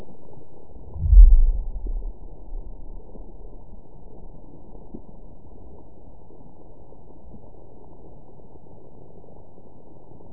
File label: seismic pulse amplified seismic; Guadeloupe West